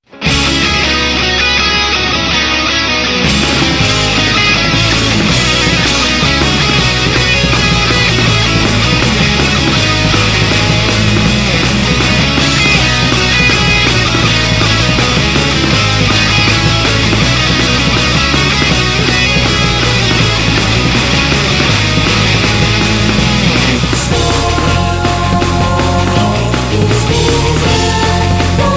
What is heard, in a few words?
Metal